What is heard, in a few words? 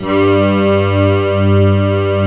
SAD ACCRD; TRISTESSE